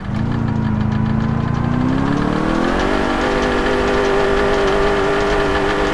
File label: first gear reverse, vehicles; emperor